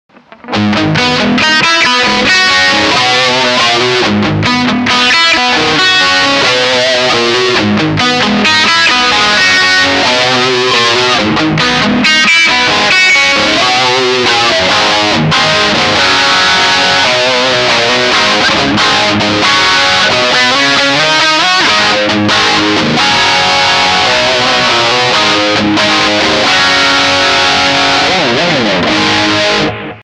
Plexi Tone Rock; Carl Martin; EVH

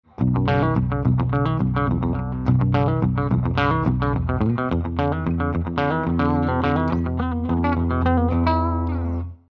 EMG 81 Bridge Clean

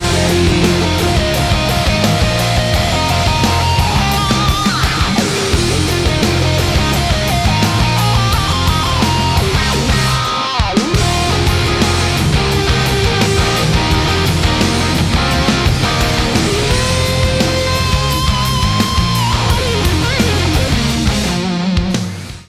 solo

